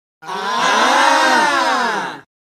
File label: aaaahh